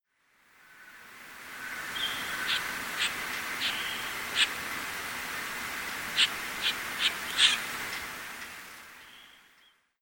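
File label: Asian glossy starling, ishigaki, call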